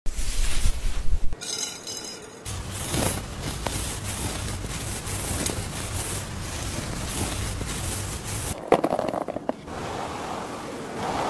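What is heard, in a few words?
head massage, asmr; ماساژ سر اسمر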